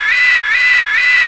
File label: Victory Pig; The pig squealing; victory sequence; Toon Link; Zelda